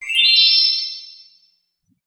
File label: Shine